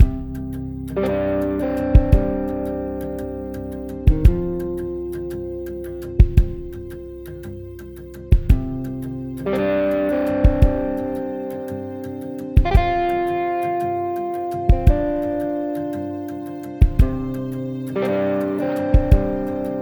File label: Tension Loop 113bpm 16bar